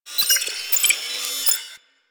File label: Computers Random B; Warframe; Ambience; Grineer; Grn Computer Room 1